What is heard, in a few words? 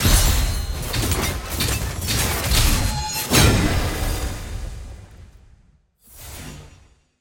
sfx tier wings promotion; ranked; to challenger